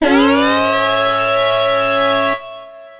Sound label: School Alert